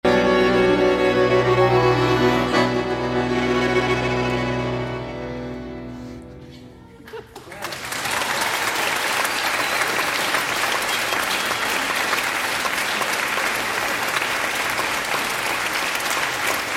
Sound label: TANGO